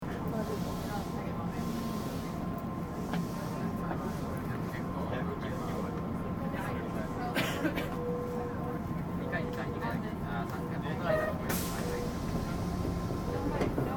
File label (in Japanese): 10系, 京都市営地下鉄烏丸線用の車両です。頻繁に電子警笛を鳴らしています。; 烏丸線今出川〜丸太町; 走行音